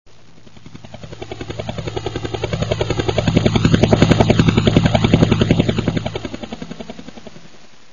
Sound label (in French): texture hellico